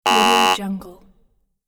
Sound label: thin wrong; interface sounds